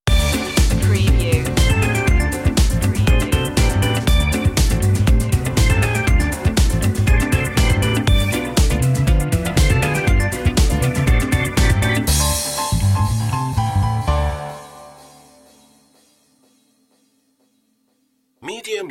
funky blues; media music now

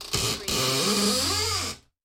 Shower Door Creak; Household Sounds / Bathroom Sounds; beanstalk audio

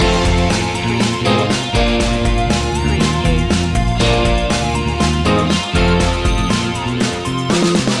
motown sparkling loop

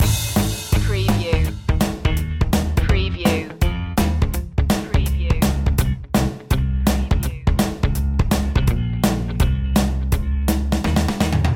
a new day has begun loop

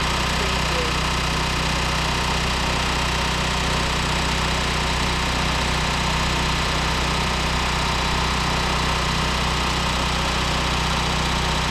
fuel pumping truck loop

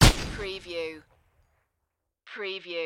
EXPLOSION MORTAR FIRE

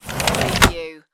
sliding window

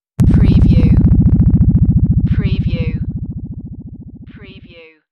Bass Ringer

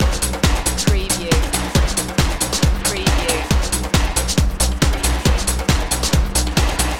samba party loop

